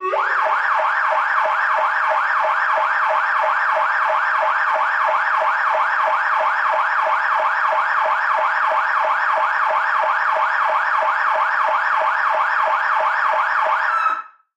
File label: fire engine siren